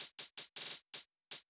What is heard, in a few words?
Hi Hat Loop; STMPD